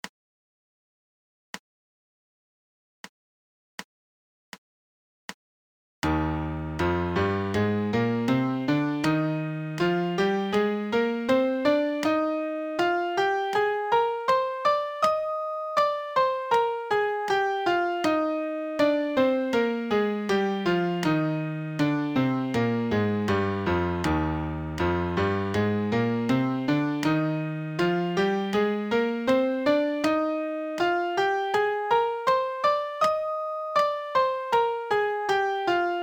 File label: MIDI Major Scale Eb 80; Piano Practice Tracks